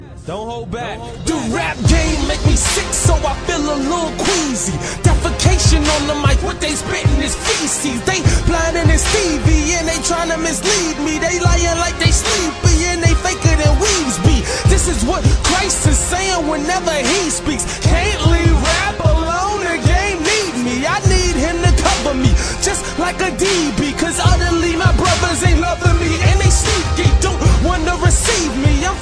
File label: iPhone Remix